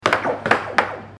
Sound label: air hockey hit rebound sequence